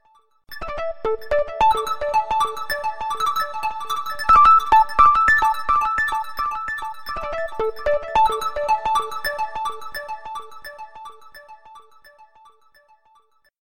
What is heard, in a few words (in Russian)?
sms na iphone sms kotoraya zvuchit na iphone